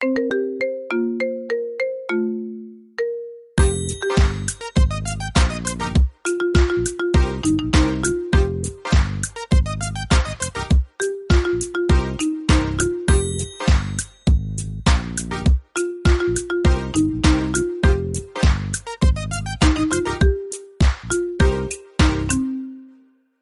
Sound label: Marimba Remix Ringtone